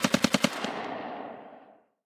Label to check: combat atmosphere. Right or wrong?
Right